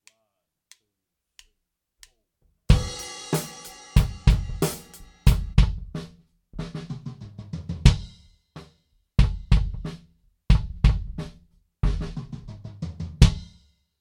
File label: Gate Kick; Drum Recording Setup